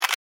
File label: camera click; ui; PadFone